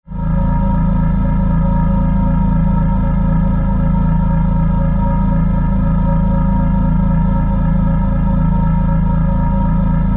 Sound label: Force, Field, Energy, Source, Scifi